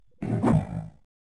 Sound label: gorilla short growl; soundscrate